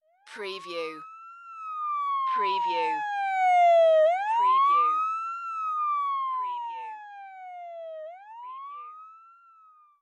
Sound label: Fire Truck Siren, Pass By; Vehicle / Transport Sounds / Lorry / Truck Sounds